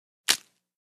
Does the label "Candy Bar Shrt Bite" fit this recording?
Yes